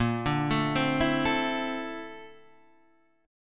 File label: A sharp Major Sharp 11th, Guitar, Half Step Down